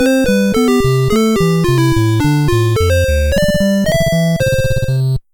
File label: game over; nes